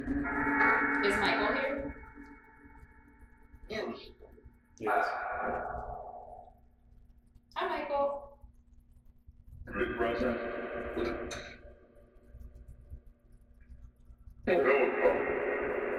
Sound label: 2nd Floor Dark Wing Phasma Box